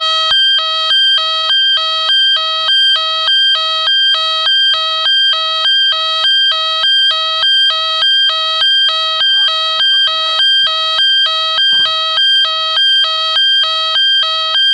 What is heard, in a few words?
alarm